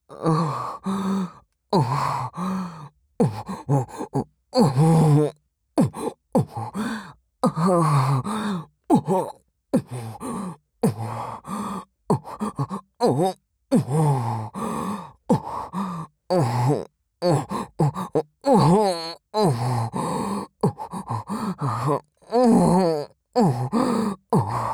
喘ぎ 左; マッサージフェラでお漏らし射精

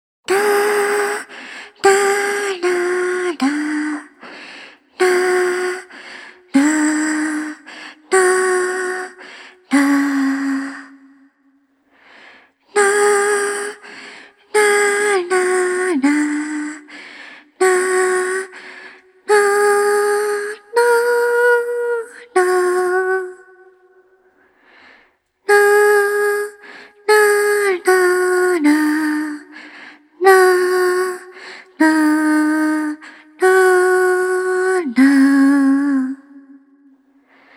子守唄 リバーブあり

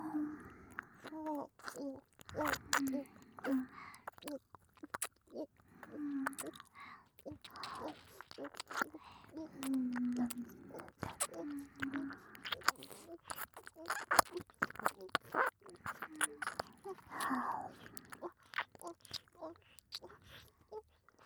イラマチオ＆耳舐め＆指フェラ同時再生

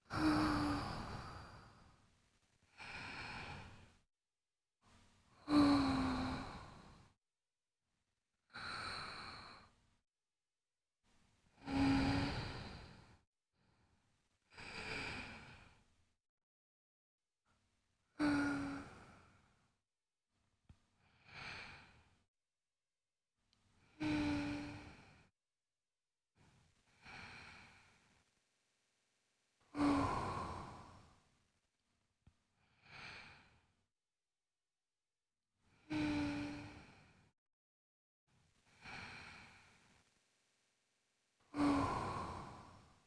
寝息ループ（左側）, ASMR

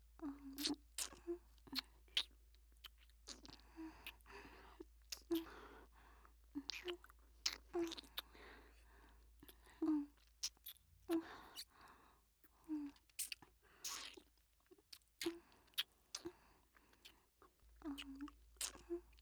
お姉さんと僕のキス; 僕とお姉さんの音声; ループ音声集

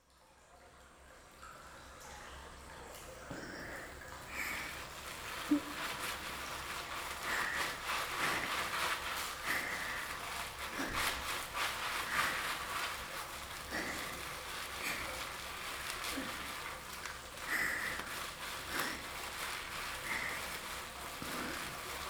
徹底的に泡だててからのゆるゆるソフトアカスリ（体の裏・表）, ASMR

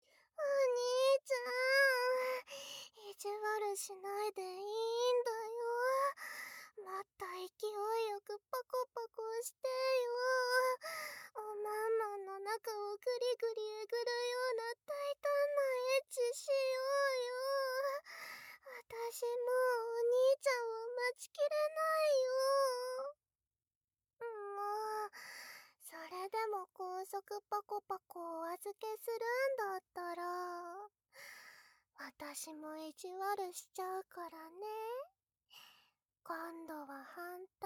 左耳舐め; ステージ２左耳舐めまで; ASMR

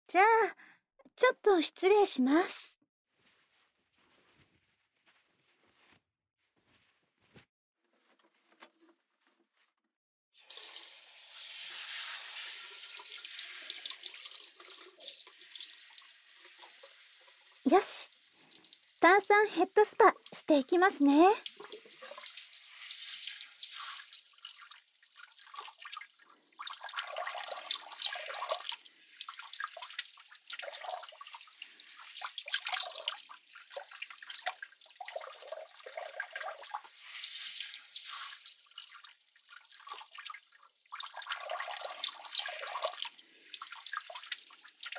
炭酸ヘッドスパ

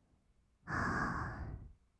"あ", ブレス, 吐く音, kawaii voice; ASMR; 1200 kawaii voice!!

